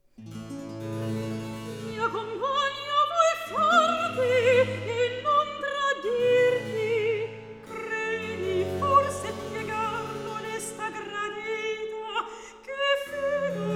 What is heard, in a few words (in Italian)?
Recitativo; Mia compagna vuoi farti; Alessandro Scarlatti; Duet Cantatas